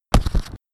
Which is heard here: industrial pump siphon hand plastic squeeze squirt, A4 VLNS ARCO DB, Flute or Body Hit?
Body Hit